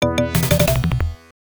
Wave Complete; Off the Wall

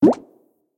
Feedback General

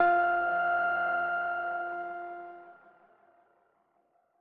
chime; chime sharp

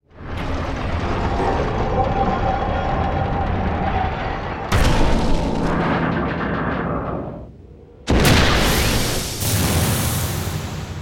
Evacuation

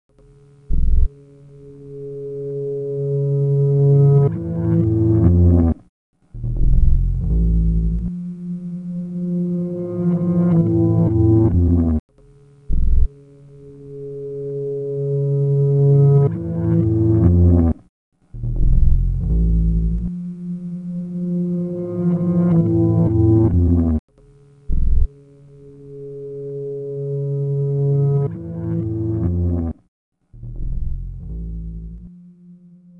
Bass; Despiria; Dreamcast

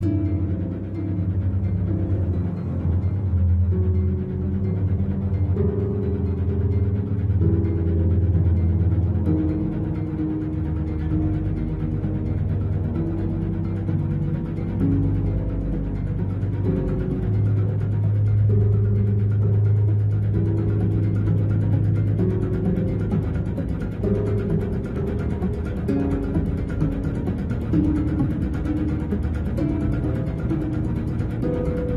Stealth (Loop); The Walking Dead: The Telltale Series

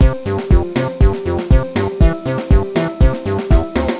samba pop; Touch Darts

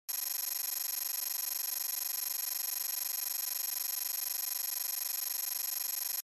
UI Score Counting; Eximius: Seize the Frontline; GenericUI

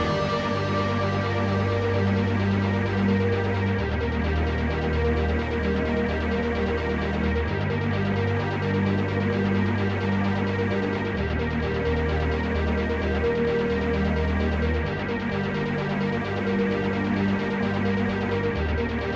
music loop intro

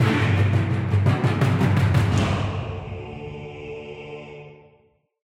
Bigbattle Loop End

